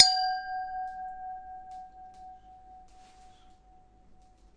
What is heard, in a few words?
glass camerton ish, Crystal Glass Strikes